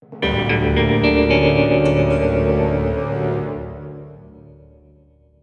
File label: Sting